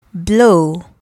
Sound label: blow